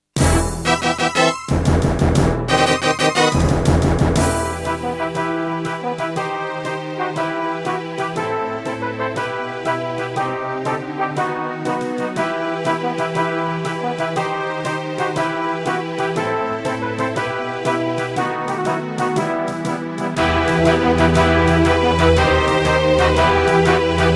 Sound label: Terratec MiniWaveSystem SOWT-11; Warcraft II